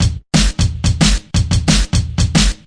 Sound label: break, DnB Breaks, 179 bpm; ethex